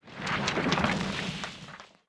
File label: rock, materials; ambient materials; Half Life Model Pack; Half Life 2